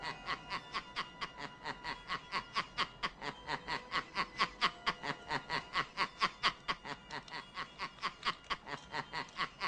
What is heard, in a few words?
laughbag; Joker